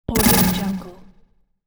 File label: weapon power up glitch